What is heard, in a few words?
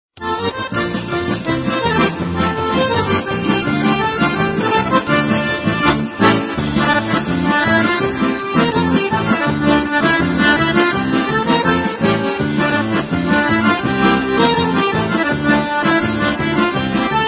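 Hora Instrumental, Israeli Dances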